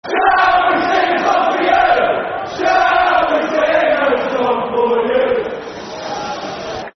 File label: Shall We Sing A Song For You, Football Chants (Soccer Song); Tottenham Hotspur Thfc